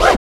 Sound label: PRC XSCRAT, Rappin Perc